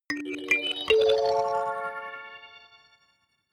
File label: notification kalimba magical triad up major